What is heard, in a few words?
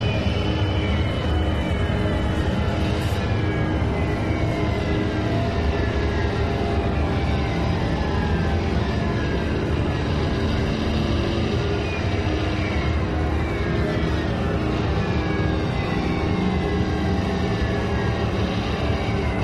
Loop Tense; the walking dead season one